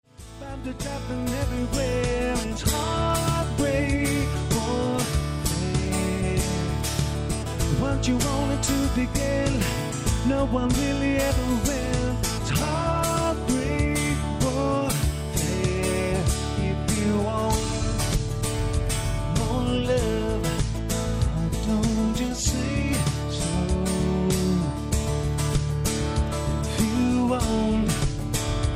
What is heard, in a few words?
live, Acoustic Guitar